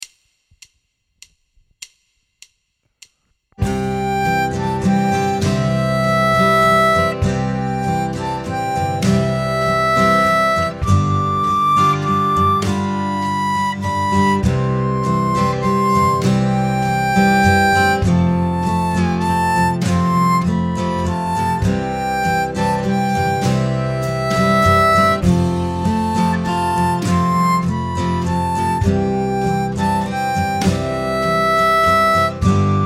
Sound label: C 100 harp; стандартный пример без бендов